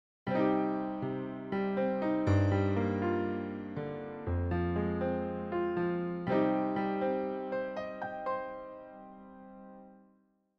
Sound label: piano